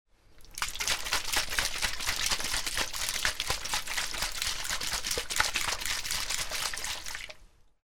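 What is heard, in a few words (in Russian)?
тряска воды в бутылке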